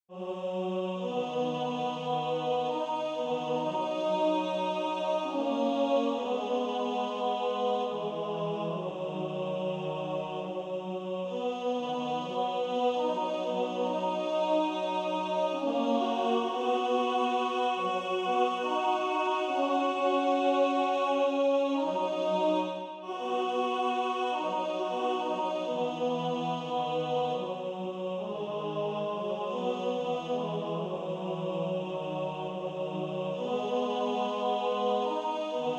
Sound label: Male Choir TB